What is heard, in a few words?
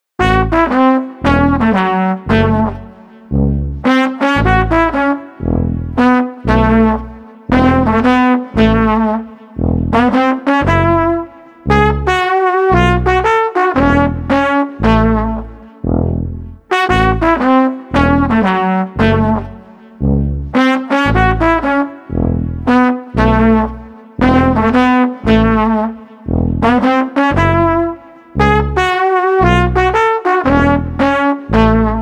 Street Tuba Trumpet Oompa no Drums 115